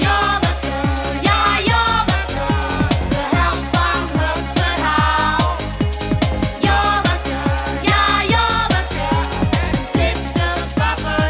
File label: tune; Jommeke